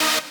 lead

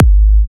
Kick; WAProd Free Kick Samples 2018; WA Kick Pack 4

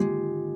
harp

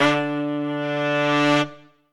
Trumpet melo

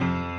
piano

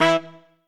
Trumpet melo

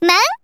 SE GAME BREAK; maimai

